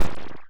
portal miss; ASCIIpOrtal

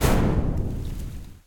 explode small; hex-a-hop

